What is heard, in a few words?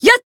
attack